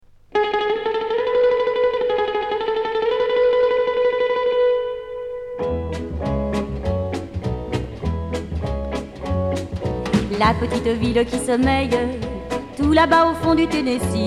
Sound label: banjo